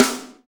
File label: SD Real, Roland; Sound & Vision - Gigapack I